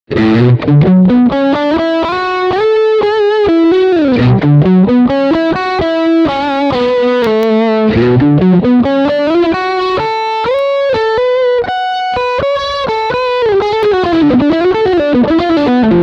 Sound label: Crunch Riff Front MIddle Pi